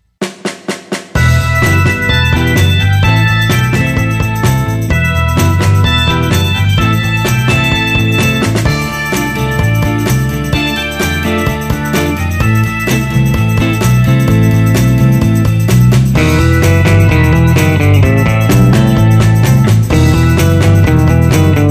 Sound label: Old School Beat